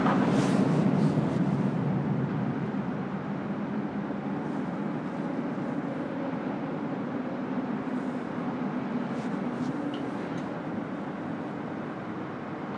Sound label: bridge on harrison over chicago river